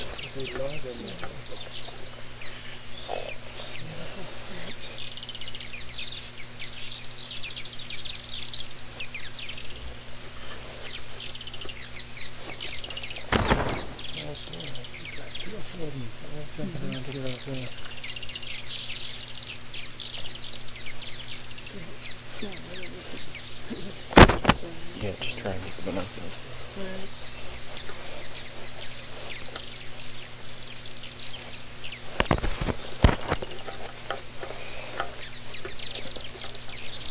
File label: fiery throated hummingbird